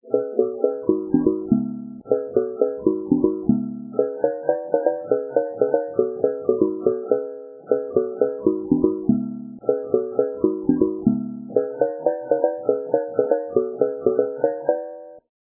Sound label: real drum